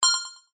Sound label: multimedia game tone sci fi futuristic beep action